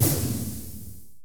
Fireball Shoot; gamerip; Grimmchild

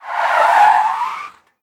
Enemy Police Car Move Out

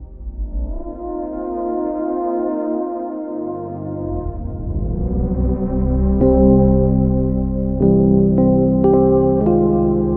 Press Conference Leave 16 Bar 75 Bpm

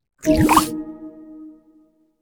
Panel Transition In

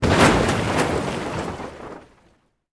Props Car Bus Flipped

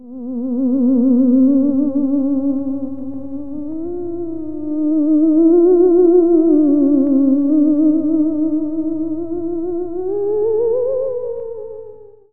Eerie Loop

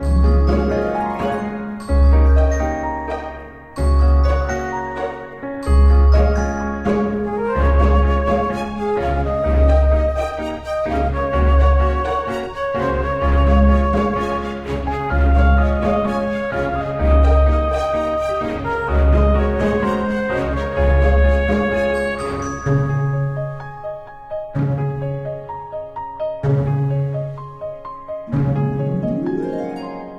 Bgm Characters Skill

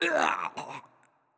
Death; Hero

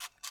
Ui Camera-Sounds

